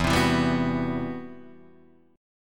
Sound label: C Major Sharp 11th E